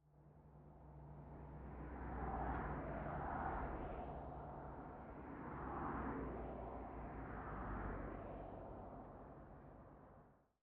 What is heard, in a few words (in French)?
Bord De Route Allemagne Passage Voitures